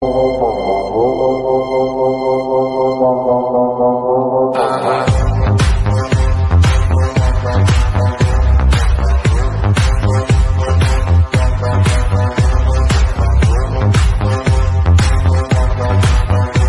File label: INSTRUMENTAL MIX RINGTONE